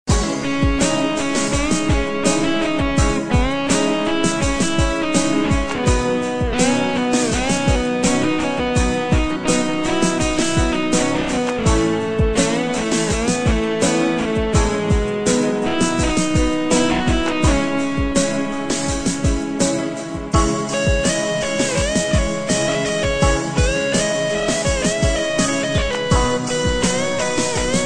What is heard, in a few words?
Instrumental